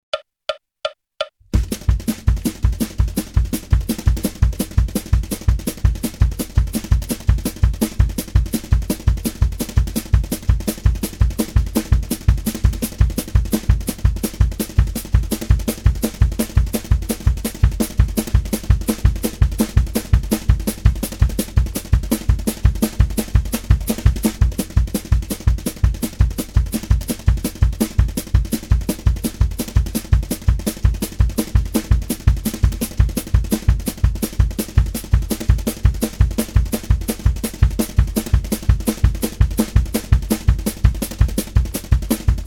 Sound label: Country Rhythm 168 bpm, Guitar; Guitar Aerobics Disc 2